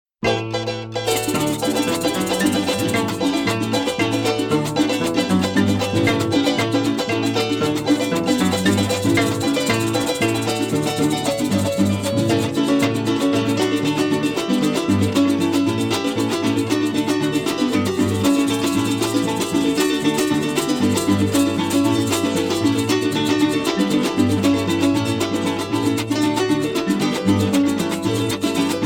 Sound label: Music Vehicle Radio Events; Jurassic World Evolution